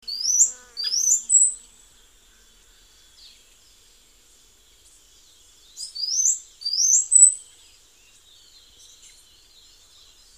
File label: Plain Wren